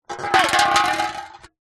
food drink soda can empty knock over concrete ground